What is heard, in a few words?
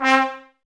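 trumpets C, brass; 90 s Sample CDs; Miroslav Vitous; Woodwind Brass Ensemble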